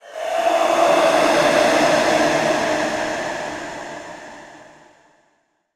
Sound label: Creepy Painting Attack